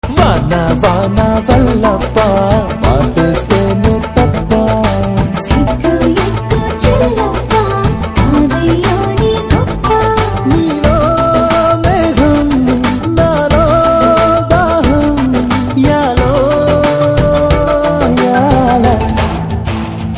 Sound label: Ringtone; Bangaru Bullodu